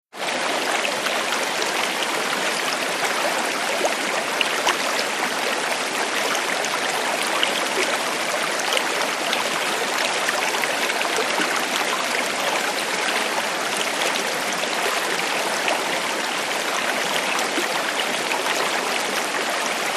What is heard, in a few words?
WATER NATURE RIVER: Medium distance, fast full flow, river wash & light bird background.; rivers